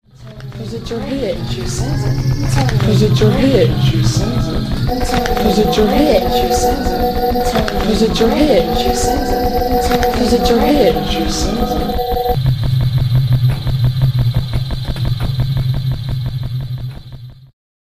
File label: shuffling